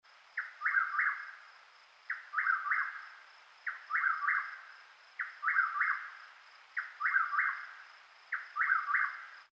chuck will's widow

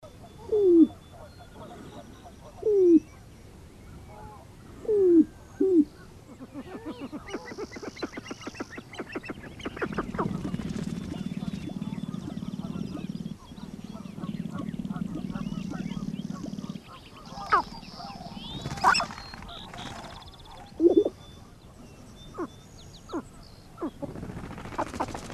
sharp-tailed grouse

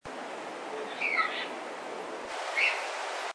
unk scream

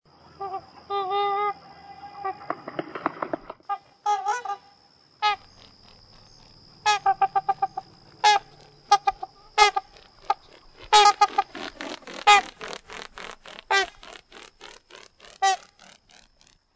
swan, trumpeter

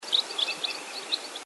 trill

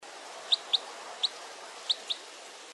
chips while eating

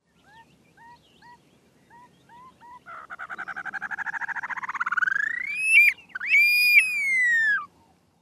upland sandpiper

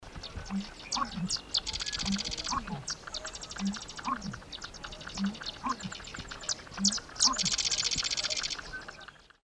crex

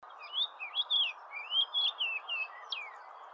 fox sparrow

